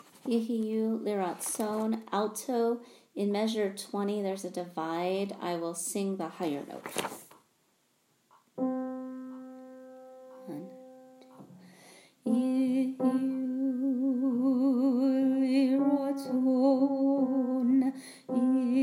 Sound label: Alto; High Holidays; Yihyu Lratson 2019